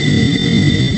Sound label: plasma burst; boosters; axis